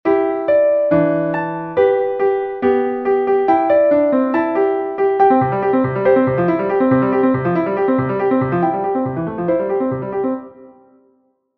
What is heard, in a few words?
upbeat melody